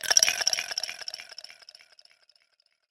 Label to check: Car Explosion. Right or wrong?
Wrong